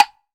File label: F-CLAVE; AKAI S-Series CD-ROM Sound Library VOL-5; CLAVES